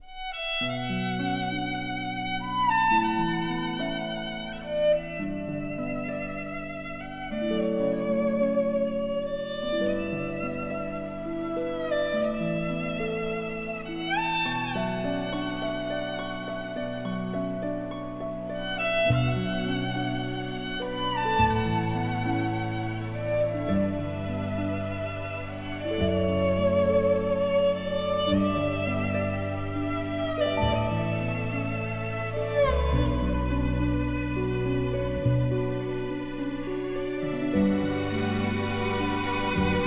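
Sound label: oriental ethnic beautiful ethnic music; vostochnaya etnicheskaya krasivaya etnicheskaya muzyka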